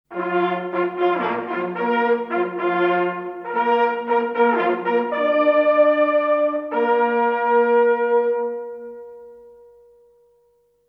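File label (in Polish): zbiórka myśliwych, sygnały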